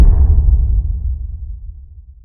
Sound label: Samsung Galaxy M12 Sms Ringtone; (India)